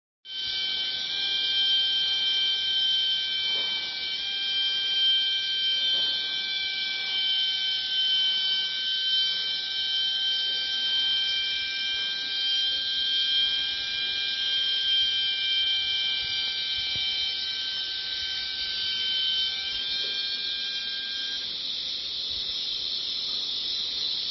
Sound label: cicada; brunei; sungai liang